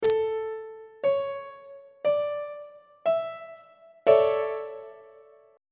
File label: d major 7th suspended 2nd 2nd inversion; on treble clef